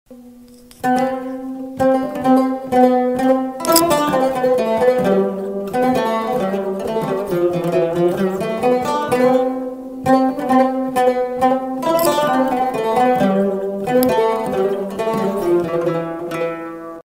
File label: Cümbüş